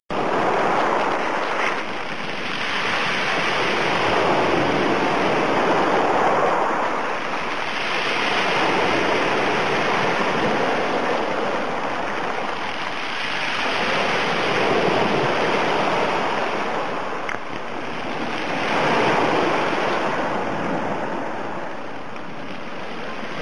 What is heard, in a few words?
surf